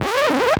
Mus Disco Zombie End

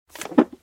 Menu In; Secrets of Magic: The Book of Spells

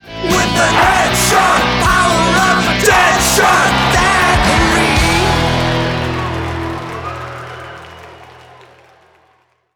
Sting; Zombie Perksacola - Deadshot

